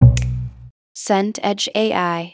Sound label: double bass slap